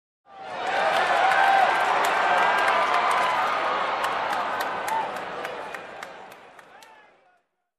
Fans in the crowd applause and cheers

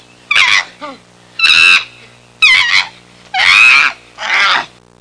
Chimpanzee howling randomly

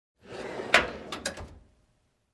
Opening small drawer

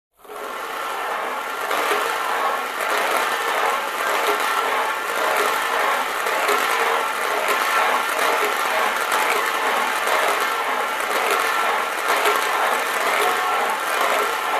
Round metal objects rolling

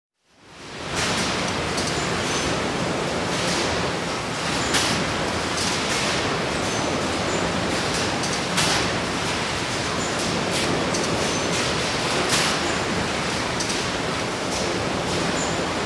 Manufacturing Machine engine noise

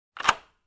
Door lock click sound

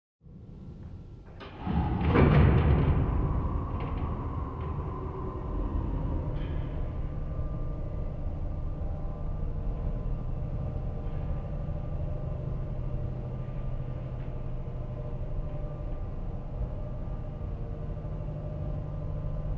Heavy Industrial Steel Door close

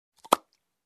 Cup placed on a table

